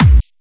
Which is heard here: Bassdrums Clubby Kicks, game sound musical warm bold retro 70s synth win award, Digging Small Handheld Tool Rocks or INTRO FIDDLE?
Bassdrums Clubby Kicks